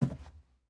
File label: Footstep Lab